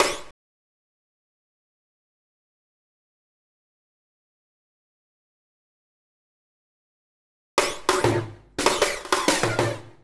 Roll, Fills; Sliver Roll; Zero-G Smoke

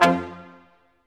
BRS Quintet staccato, Roland; Roland L-CD702

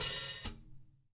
OHH, HI-HATS; Spectrasonics; Liquid Grooves; LG HI-HATS

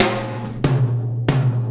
Tom Particle, Processed Drumkits; Zero Gravity Beats; Particles

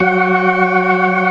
ORGAN ALL STOPS; Keith Emerson Signature Series

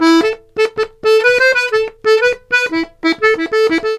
RONDO, Accordions; USB Soundscan vol.40; AKAI

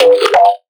synth hit double stroke tonal G; Virtual Riot

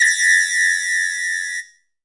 whistle lo, samba whistle; Brazil

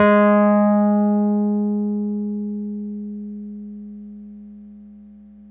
WURLY AB2, WURLITZER; Big Fish Audio; First Call Vintage Keyboards

